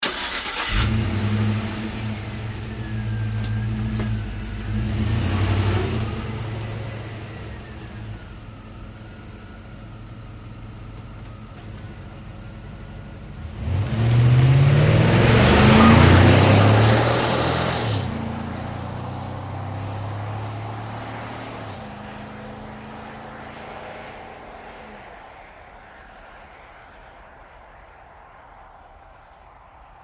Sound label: Truck GMC Savana Start Away Fast; 2010 GMC Savana; Trucks; Vehicles